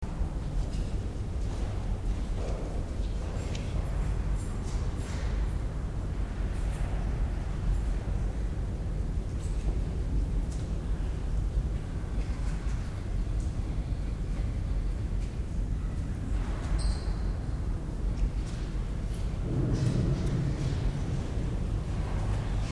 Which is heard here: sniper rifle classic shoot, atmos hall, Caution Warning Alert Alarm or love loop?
atmos hall